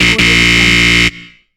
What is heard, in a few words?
wrong answer